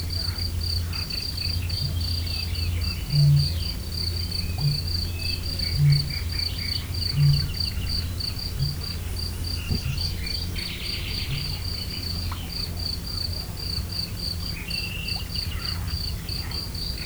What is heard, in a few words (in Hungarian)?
mezei tücsökkel, bölömbika; termeszetben